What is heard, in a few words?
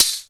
Perc, Alesis DM5